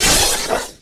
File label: Gun Weapon Pickup; SFX Kit; Pickups